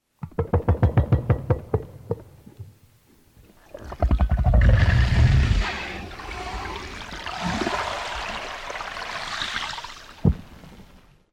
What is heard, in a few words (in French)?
Baleine grise; baleines et dauphins